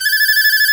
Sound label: BON DEE F3#